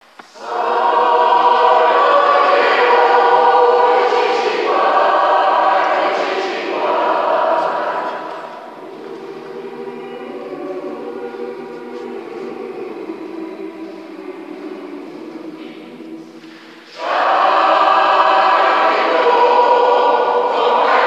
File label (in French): chorale; vocalise 34